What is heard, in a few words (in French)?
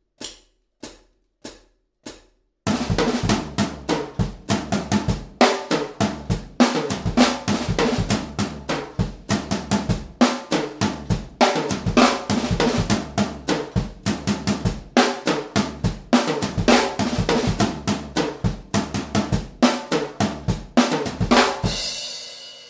break passe partout, batteur